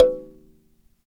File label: Dyna Sansa, Africa; Roland LCDP11 Africa VOL-1; Sansas